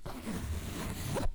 Course Fabric; BB Retail Therapy Sample Pack

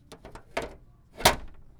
Plastic Clatter; BB Retail Therapy Sample Pack

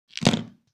item stone; RPG

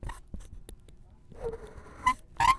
Monster Noise; BB Retail Therapy Sample Pack

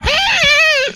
Death, Vocal; retro arcade

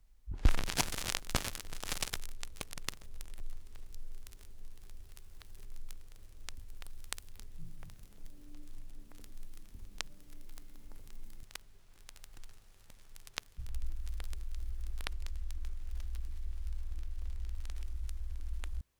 Good Clean Noise, record fuzzies; Micro Pack

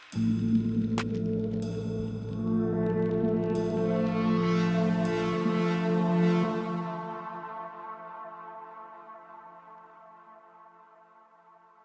Swell Outro, Pads

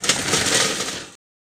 falling; breaking falling hit sfx

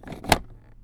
Plastic Thump; BB Retail Therapy Sample Pack

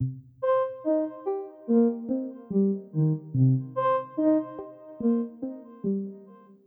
Arp Intro, Loops

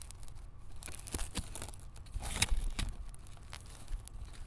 Crinkle Plastic; BB Retail Therapy Sample Pack

